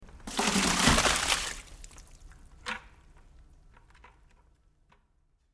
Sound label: slops